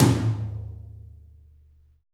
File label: SLAMTMLO; Drums In Your FACE!